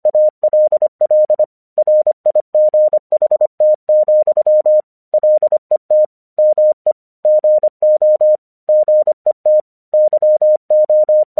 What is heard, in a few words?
English 25wpm